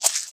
noise

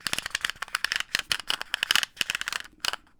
Twist Cap, Pill Bottles

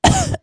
cough, creature

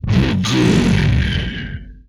inhuman attack, vocal; retro arcade; MMRetroArcadeSoundsPack

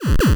square laser cannon quick; Square Waveform sfx

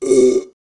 burp, creature

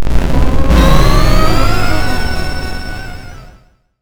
Retro Ascending Long

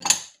glass

